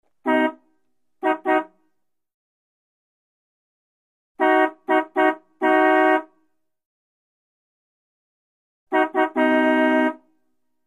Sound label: 1938 Buick Horn Honks